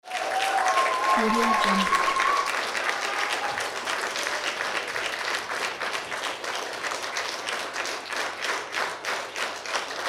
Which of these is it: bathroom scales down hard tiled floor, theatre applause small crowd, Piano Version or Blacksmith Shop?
theatre applause small crowd